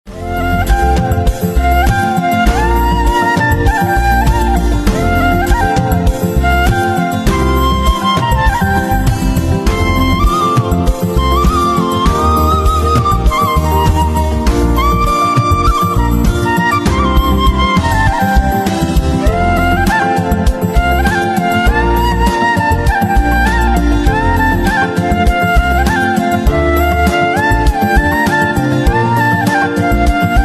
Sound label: Flute Instrumental Ringtone